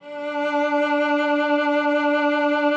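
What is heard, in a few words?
D2, cello vibrato; Xsample VOL-7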